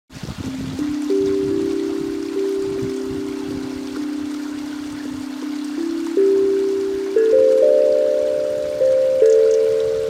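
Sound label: C Major Natural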